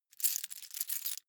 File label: key ring large rattle; objekte; schluessel